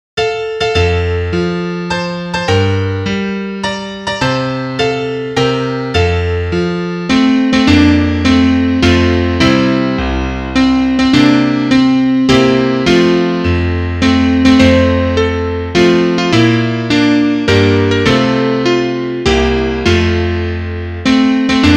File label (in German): Klavier; zum geburtstag viel glueck